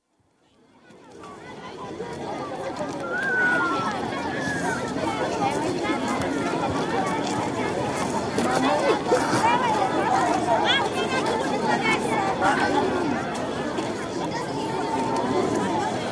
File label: Ethiopia: Bahar Dar, market atmosphere, with some traffic & distinct speech (Amharic); Outdoor Sound Effects; BBC